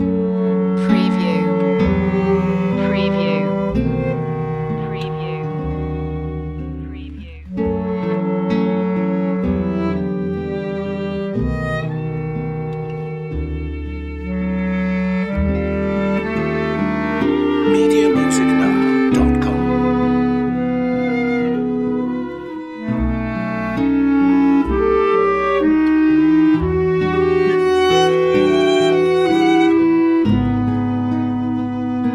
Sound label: winter melancholy loop